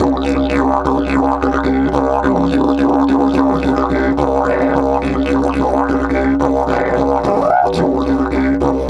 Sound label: 108, DIDGE 108BPM